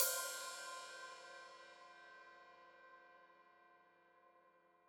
Hat Open, Hi-Hat; Deeply Sampled Drums

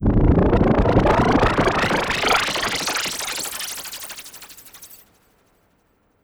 Sweep, Fx, Sweeps, Trance; Zero-G Trance Inducer

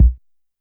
BD Ana Soft H; YAMAHA RM1X

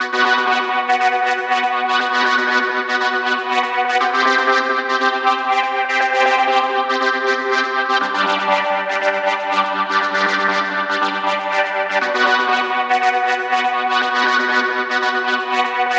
Progressive Synth, Trance

